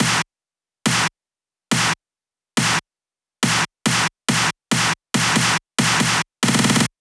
140BPM SNARE 4Bars; Vengeance Samples; Snares&FX Pairs

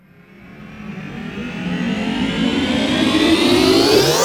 Sweeps Medium

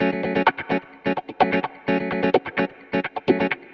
Electric Guitar - F# min, 128BPM; Electric Guitar Kit; Vengeance Samples; Special Sounds

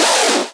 Synths F#; vengeance essential club sounds-3

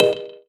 Synth Cuts; Vengeance Samples; VES1 Instruments

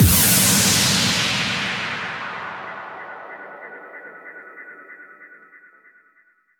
FX Hit, 140 BPM; Vengeance Samples; Song Kits; Rap; U Got Me Rocking

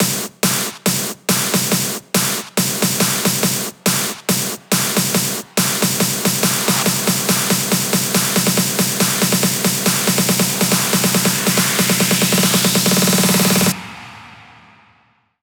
140BPM 8Bars, HandsUp & Trance Fills; LuckyDancer; Vengeance Samples

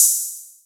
Cymbals HH Open; Vengeance Samples

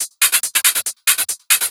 Loop 140 BPM; vengeance essential club sounds-3

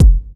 Bassdrum; Vengeance Samples; VEE Bassdrums

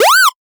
Fx

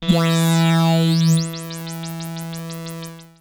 F E3, synth; Roland S100; musicradar-synth-samples; Roland F Multi

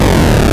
Arcade Riffs

